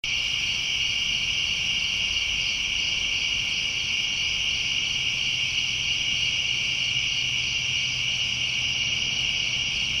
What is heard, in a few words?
Summer Sounds